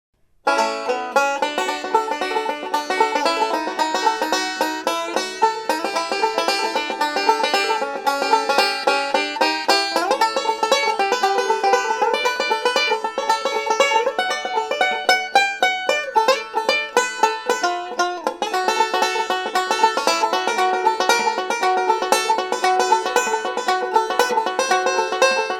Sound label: banjo